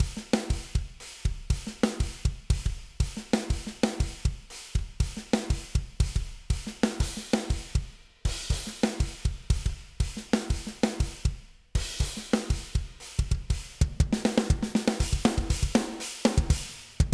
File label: drums with reamp; drum re-amping